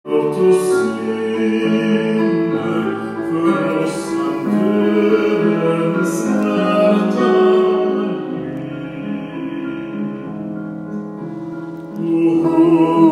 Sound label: o helga natt alt; åfjord